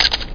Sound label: Camera; With Open Eyes - The Art Institute of Chicago